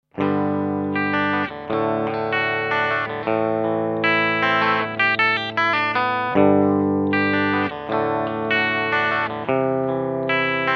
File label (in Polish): Clean, gitara